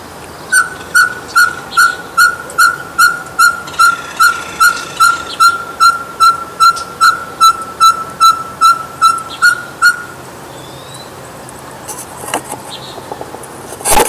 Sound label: Glaucidium Brasilianum; Aves; Strigiformes; Strigidae